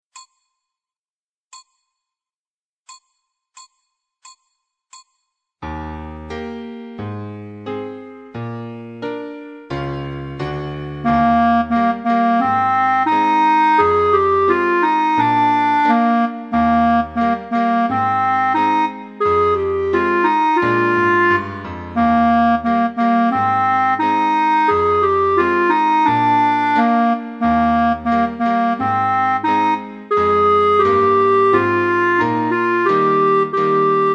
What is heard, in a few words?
with Solo; Sawmill